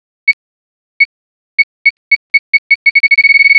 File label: grentimer; Dystopia